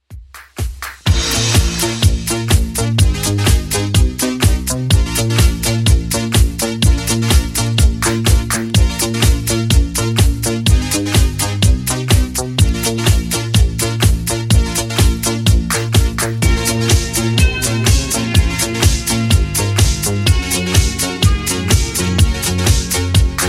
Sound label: 80s Redrum 125bpm